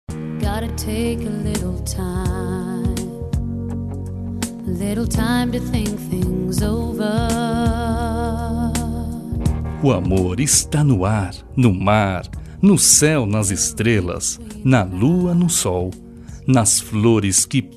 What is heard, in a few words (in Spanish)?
Romantica - voz masculina